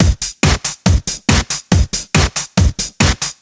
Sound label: Breakbeat 140 BPM